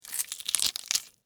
Tear Rip Green Pepper, Texture, Tear, Rip, Green, Pepper, Skin, Vegetable; BlastWave